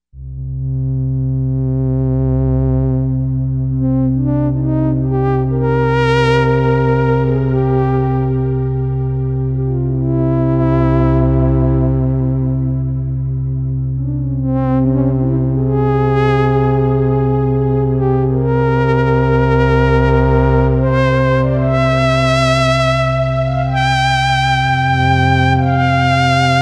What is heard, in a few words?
Sawpressive